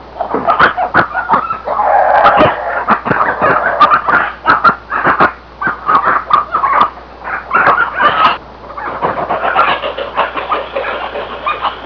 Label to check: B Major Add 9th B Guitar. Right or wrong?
Wrong